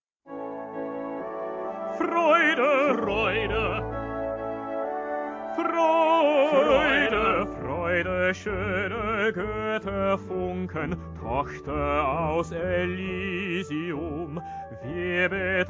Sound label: Alto; Beethoven 9